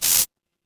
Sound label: feedback level up